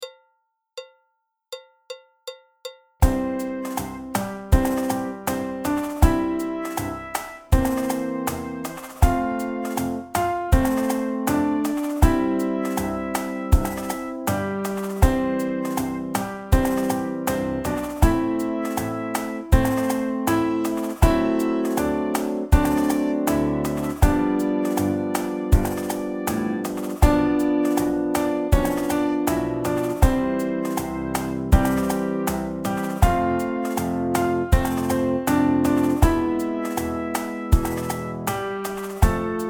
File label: railroad Backing Track, folk song; ive been working on the railroad